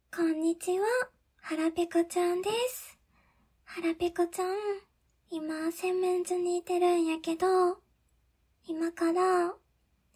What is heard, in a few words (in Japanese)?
洗面所で! 使い捨てコップ(プラスチック)に! オシッコですッ!